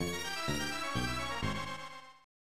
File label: Lose a Life; Super James Pond; SNES